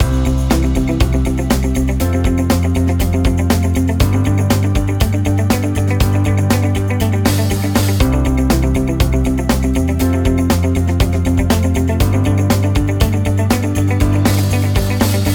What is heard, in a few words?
title music